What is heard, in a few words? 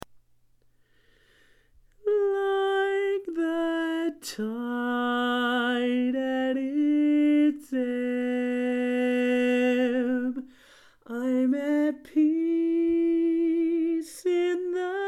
Tenor